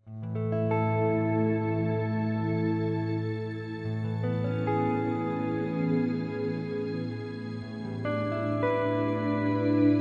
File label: X SPACE SHIMMER; xgear